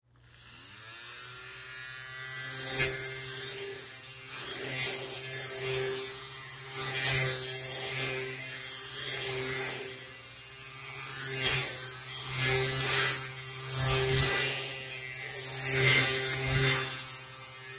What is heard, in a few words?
Fan Small Handheld Flying Moves Fast; Electronics